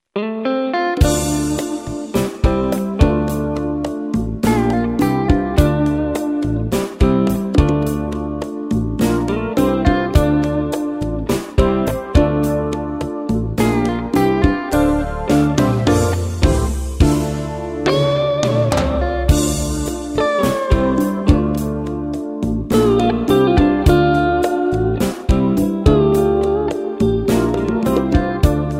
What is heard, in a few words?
Guitar Magic